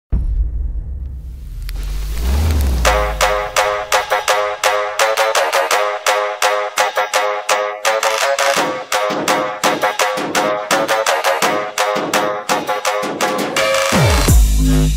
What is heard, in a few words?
instrumental